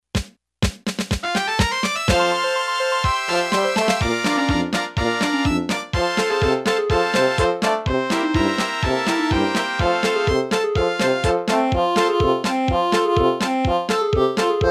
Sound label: Yamaha PSR-300 Factory Demo Songs; O, VRENELI